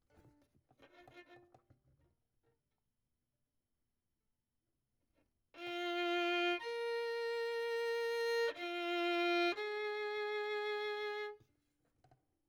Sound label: Violin; Verdigris Music